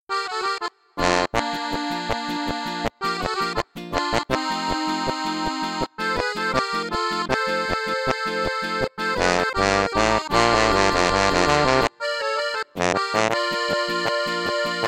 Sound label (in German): Bariton; Lechner; Erman normal